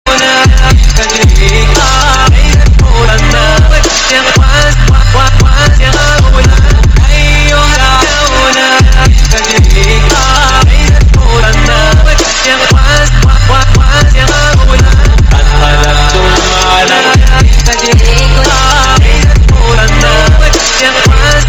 arabic trap

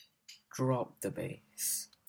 drop the bass

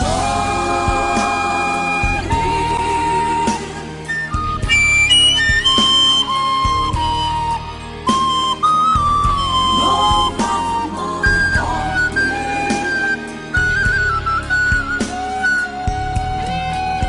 emotional titanic flute

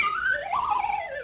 warbly whistle rise and fall

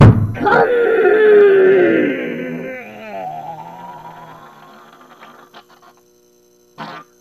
muffled

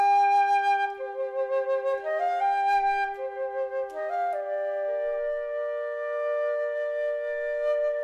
flute cover

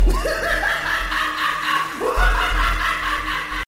lagh

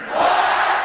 loud crowd what; stone cold

